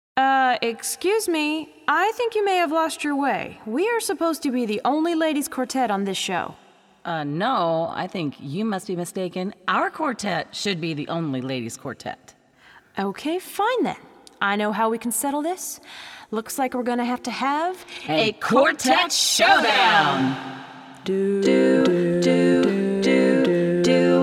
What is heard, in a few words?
female quartets